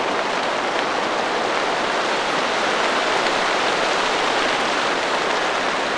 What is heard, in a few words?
Rain; ImpGal2; groundwar